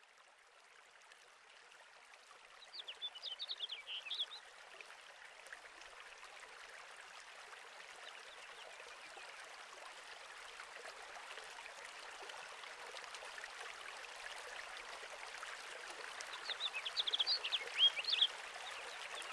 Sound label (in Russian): Yaponskaya muzyka Zvuki prirody Ruchey pticy